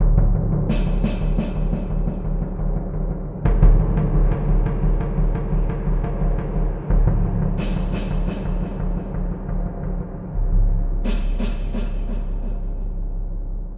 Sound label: Lo Mid Perc